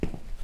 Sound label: Step